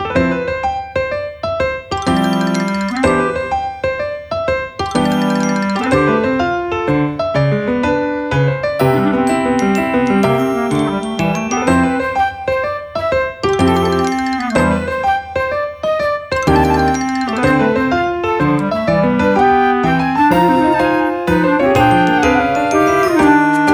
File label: Petite Walk loop, BGM